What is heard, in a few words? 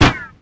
Impact Bullet Metal Mono